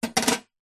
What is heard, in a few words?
industrial wrench spanner metal small set down on metal tool box; zapsplat